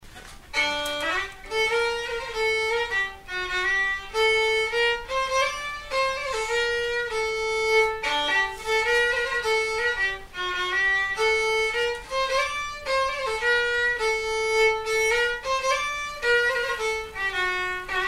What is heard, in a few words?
polska slow